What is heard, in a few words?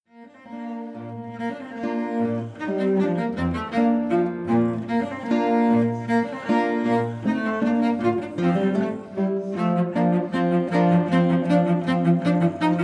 Cello Consort Allegro; Italian; Sonata n.4